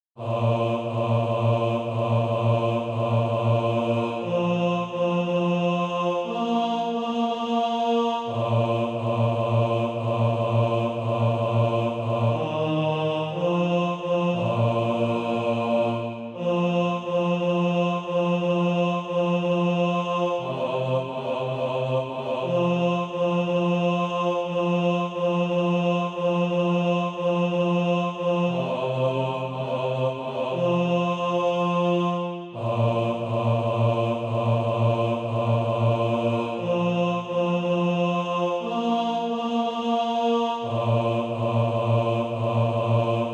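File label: bass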